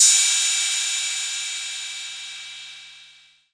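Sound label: 808 cym, cymbal, drum machines; 808 Extended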